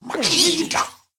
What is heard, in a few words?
NORMAL LEMUR